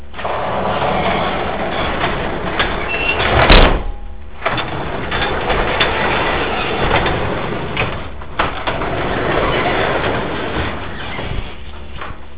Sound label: dry garage door rollers